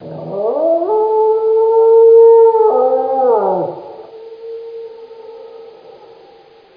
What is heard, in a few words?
dog amb; HLhunger